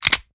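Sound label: pistol reload empty extended mags large charge; gmod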